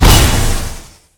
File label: Exoblade Dash Impact; Garry's Mod; omnidroid 13